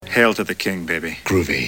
Groovy

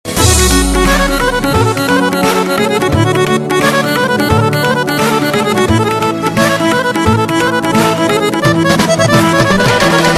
Kavkaz Music

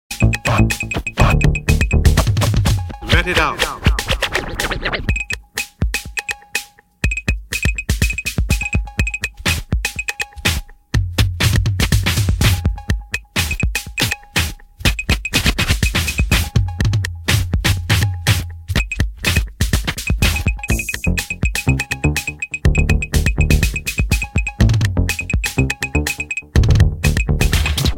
Funk

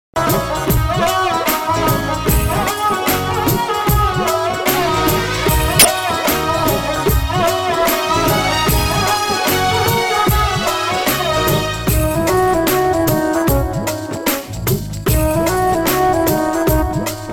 Sarangi

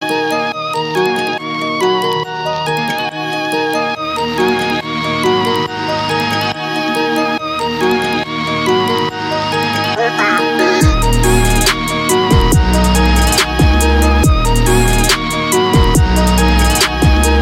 Golden hip pop

